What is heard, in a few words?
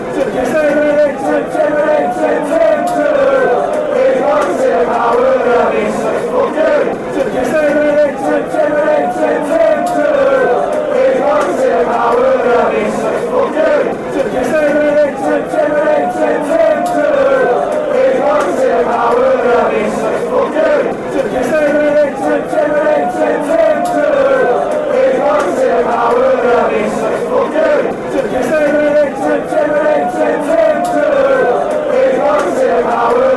fanchants; tim howard